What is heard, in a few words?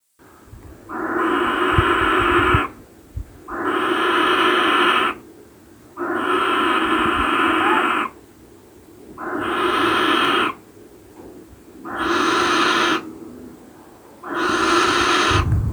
Barn owl snore